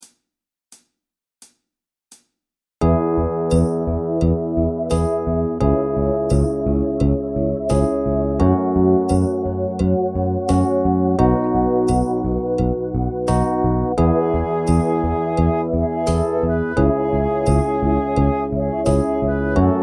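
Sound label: Drum Groove Slow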